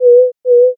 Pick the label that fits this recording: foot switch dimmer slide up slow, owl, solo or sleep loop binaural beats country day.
owl